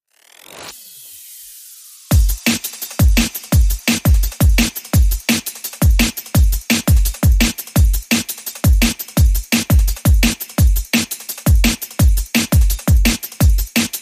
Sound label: Crackling Beats Beat